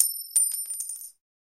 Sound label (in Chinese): 音效 丢硬币